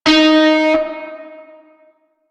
Shawzin Oct Three D Sharp; Music; Warframe; Narmer Shawzin

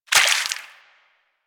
Water Sprayer Surface Impact; Warframe

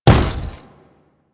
Step Default, Footsteps; Warframe; Grineer

